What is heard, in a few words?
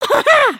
camera picture